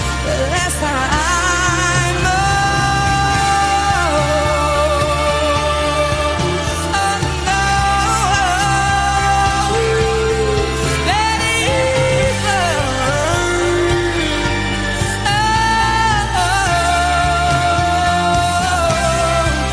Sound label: english music ringtone